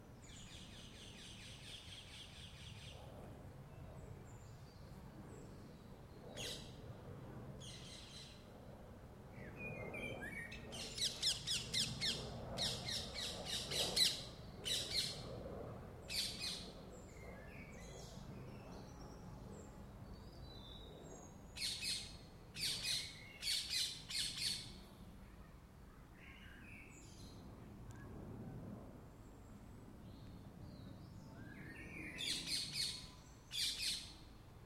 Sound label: birds in my garden at dusk mainly a noisy parakeet with flute practice in the back; in the background